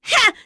Vox Jump; kingsraid; voices heroes en

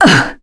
Vox Damage, kr; voices; heroes; Tanya; kingsraid

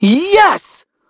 Vox Happy; Evan; kingsraid; voices heroes

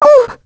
Vox Damage; Mirianne; kingsraid; voices heroes en

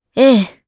Hum, jp; Priscilla; kingsraid; voices heroes

